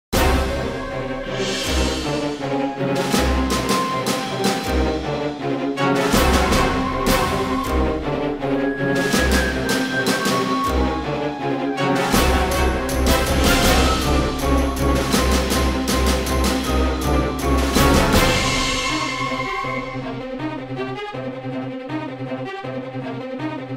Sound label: Royalty Free Trailer Music (Fast Action Trailer) Orchestra Suspense Tension